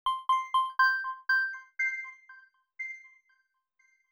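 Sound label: granular bell accent C major; accents; bespoke - Floral v.2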